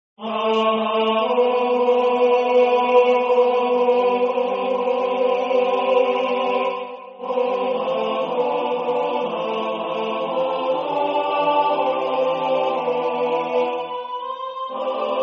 Tenor

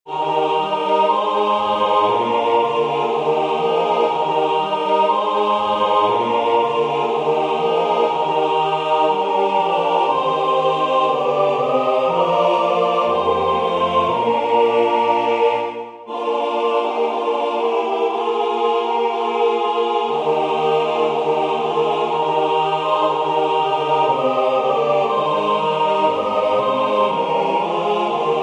Tutti; O Du Frohliche

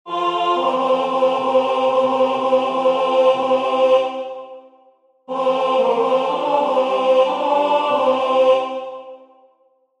Tenor; A.Dvorak; Dyby byla Kosa nabrosena

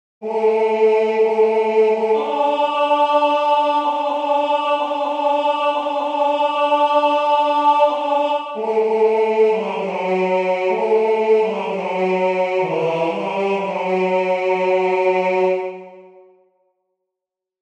Kerstnacht Cantique de Noel Tenor

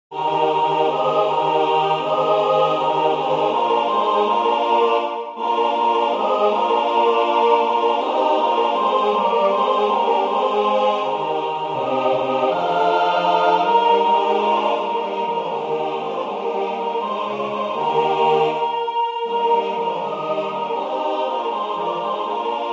Tutti; O Mistress

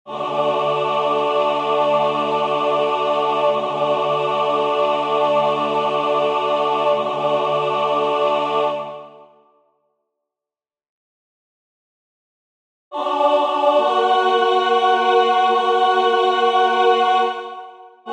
Tutti; The King shall Rejoice

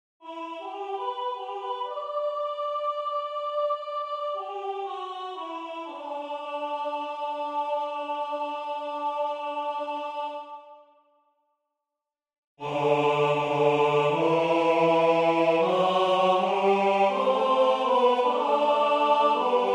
O Come Emmanuel Advent Responsory Tenor

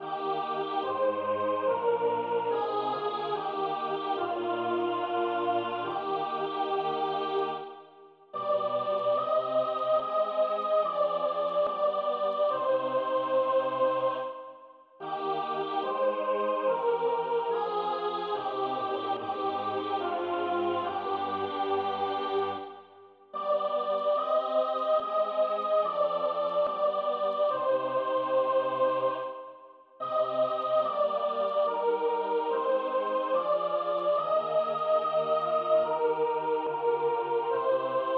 Sopr.; C.H.Graun; Der Tod Jesu

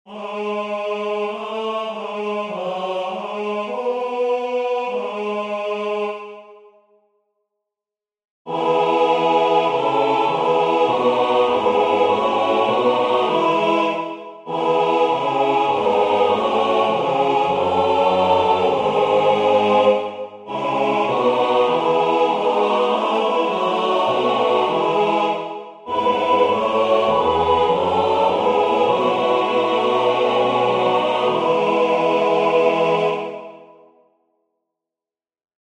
Tutti; F.Liszt; Crux ave benedicta

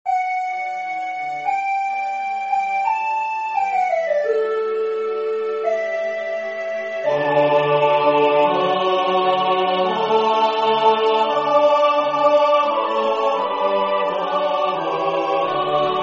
Tutti; G.B.Pergolesi; Laudate pueri

